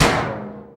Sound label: FX Dance